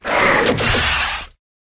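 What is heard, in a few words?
Rat buff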